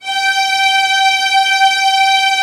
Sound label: VIOLINS, Synclavier Strings; ILIO